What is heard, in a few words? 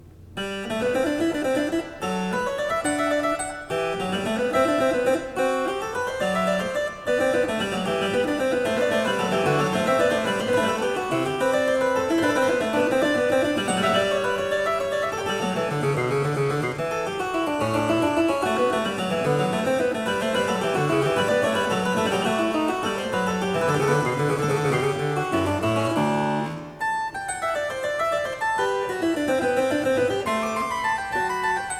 Cembalo; Variatio 27 Canone alla Nona a 2 Clav; Bach; Goldberg Variations